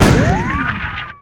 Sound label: Cannon Fire Whistle; Weapons